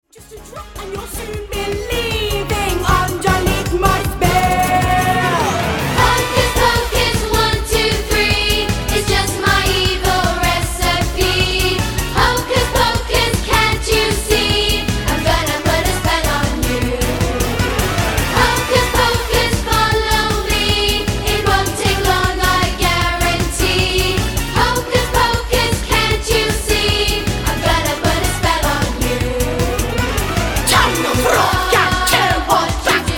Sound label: (Vox)